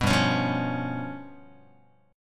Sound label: G 7th Flat 5th G down Guitar; strummed; JGuitar